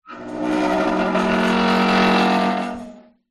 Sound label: household metal squeak groan gate open; zapsplat